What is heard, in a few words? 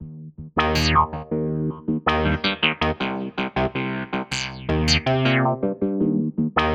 filtered loop